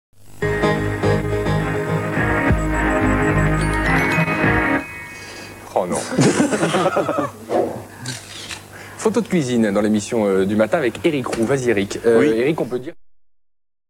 jingle; cplus; nap matin

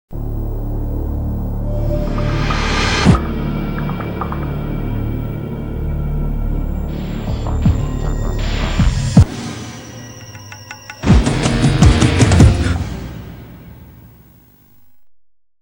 jingle; foxcrime

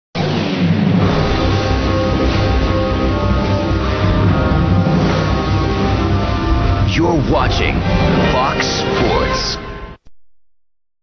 jingle; fox

